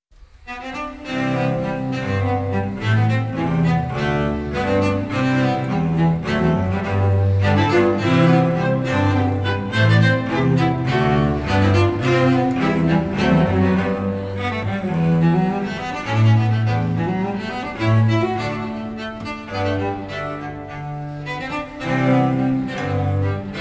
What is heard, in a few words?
Minuet